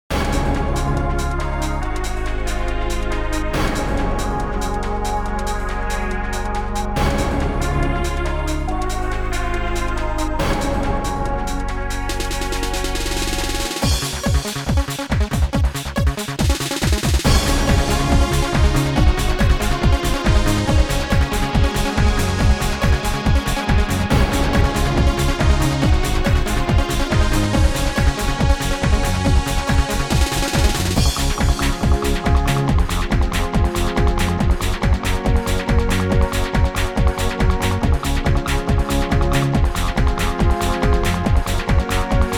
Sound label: Dancin Romantic 140